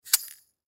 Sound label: Food Candy Bottle Of Sprinkles Shake; Food (Sound Effects:Household); Foley; Blastwave